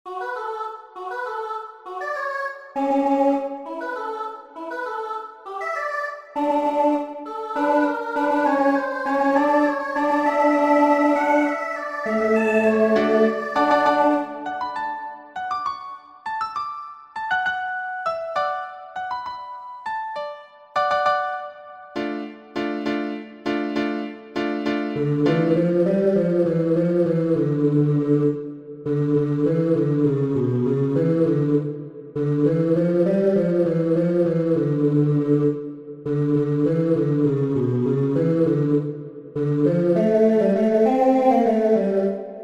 Tenor

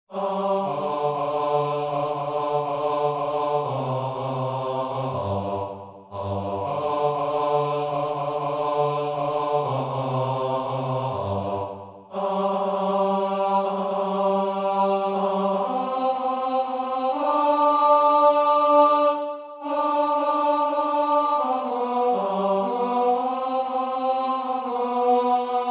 Bass

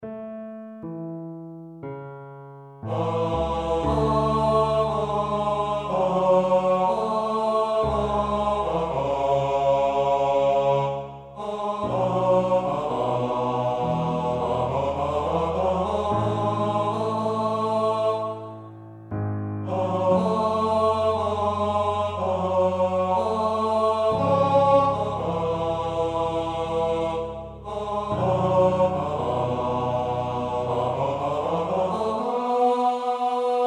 Bass

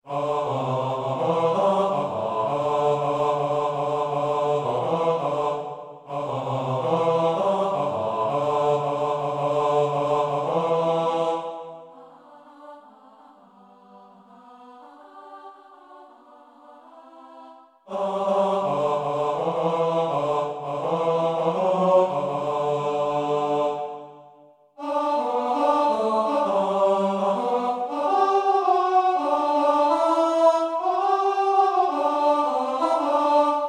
Chorus Bass

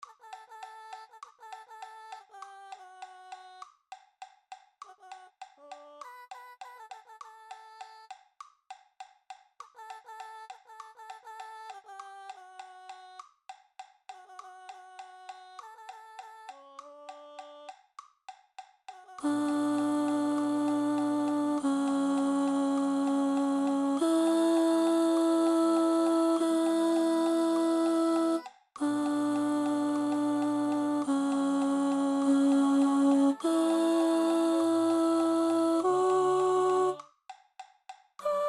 Soprano

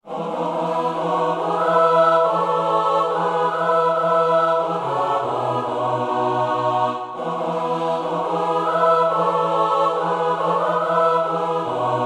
Chorus All

